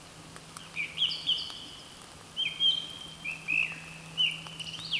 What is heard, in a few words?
Turdus philomelos